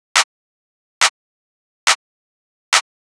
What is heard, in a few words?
drum Loop 140BPM Clap